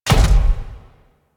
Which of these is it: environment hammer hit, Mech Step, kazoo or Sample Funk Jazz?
Mech Step